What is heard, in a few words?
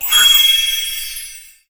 Claim Rewards Chime Only; Angry Birds